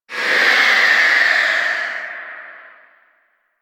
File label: Vocal Pain C, Enemies; Warframe; Priest Quest; Rell Specter